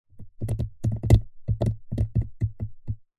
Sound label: industrial adhesive paste in plastic tub drips test consistency